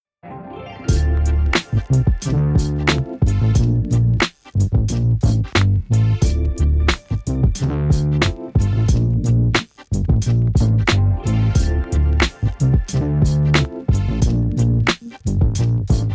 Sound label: Low Enhance on Mix On